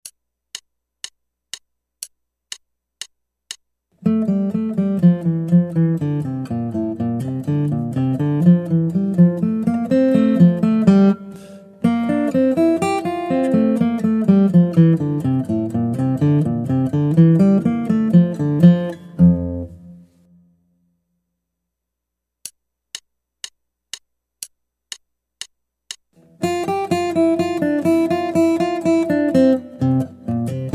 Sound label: Guitar